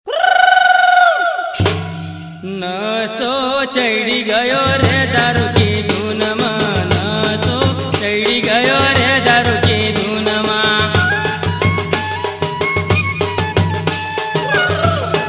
funny gujarati ringtone

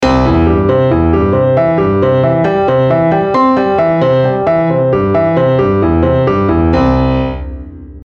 ringtone

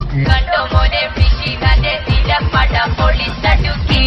sms ringtone

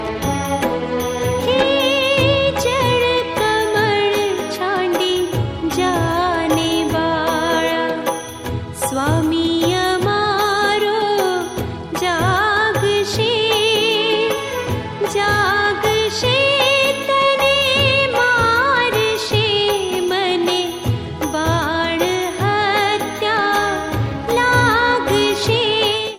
devotional bhakti

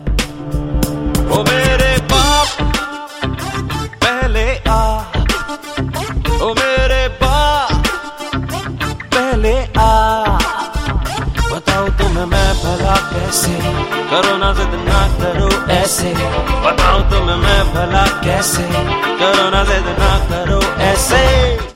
title song hindi, ringtone

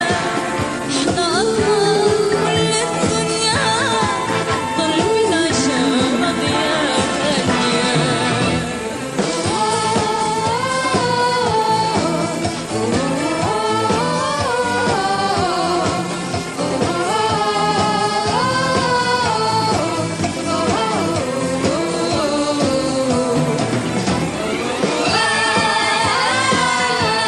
high quality morocco ringtone

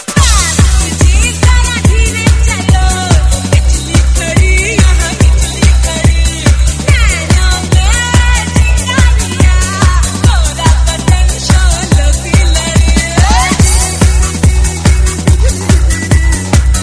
club mix

